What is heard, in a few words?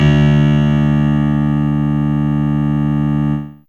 H Chord D D2, HarpChim; Fairlight CMI IIe